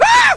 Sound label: scream